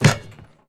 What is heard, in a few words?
Street Ball Dunk Nylon, Street Basketball